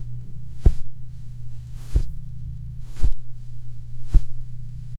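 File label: satisfactory cloth place; textiles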